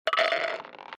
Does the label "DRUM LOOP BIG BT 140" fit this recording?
No